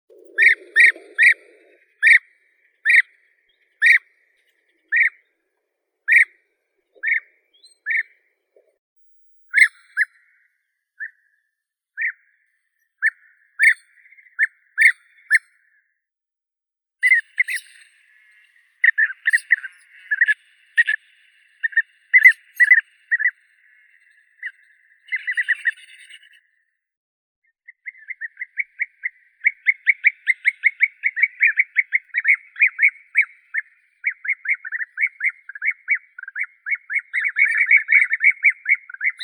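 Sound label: golden bee-eater, Merops apiaster; shurka zolotistaya; zvuki prirodi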